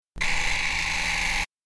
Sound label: Camera Servo Motor; servos